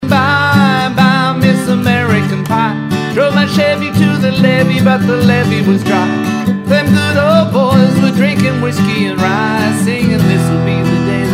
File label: acoustic